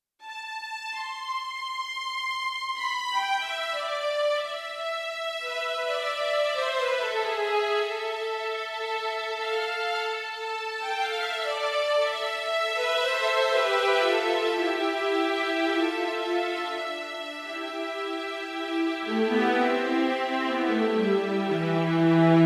Adagio Strings, Orchestral; PC3

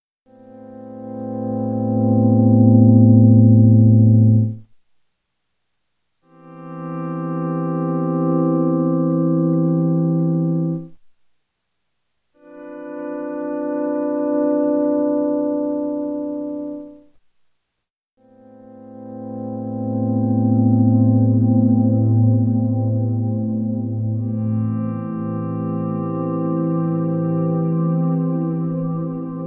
Elec Gtr vol swells

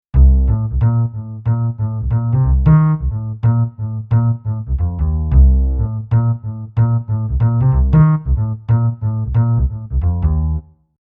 AC Buzzer Bass

